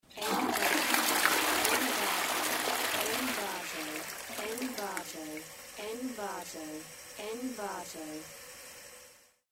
toilet flush; danielelel

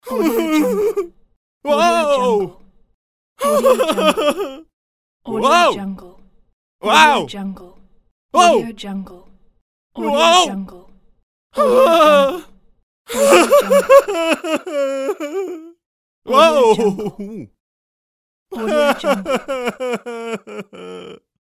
scared man

